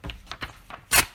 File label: Page Tear, Paper Ripping Sound Effect